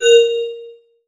photon emit IR; molecules and light; greenhouse effect

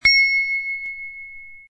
ding

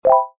selection Arpeggio

